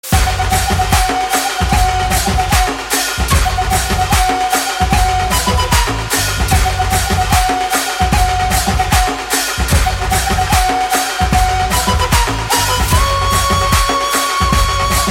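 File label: background music flute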